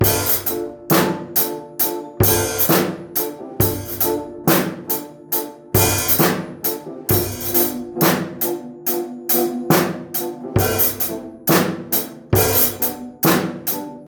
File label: jam